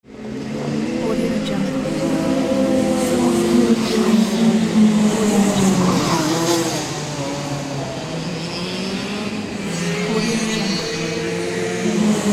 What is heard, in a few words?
race ambience